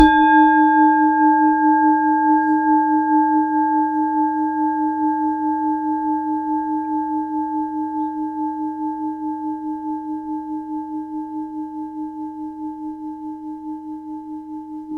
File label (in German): Klangschale Tibet D; Klangproben